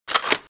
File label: draw, weapons; qbb95